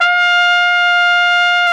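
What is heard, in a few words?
trumpet mf; Roland L-CDX-03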